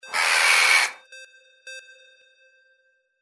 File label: CROW